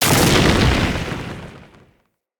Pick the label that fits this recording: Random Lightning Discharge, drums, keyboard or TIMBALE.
Random Lightning Discharge